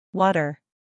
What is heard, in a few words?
Water; Relaciona Palabras en Ingles